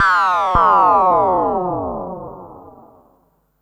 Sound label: Perc Synth Moog Delay